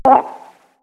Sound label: take damage; Barotraumatic; Psilotoadmonster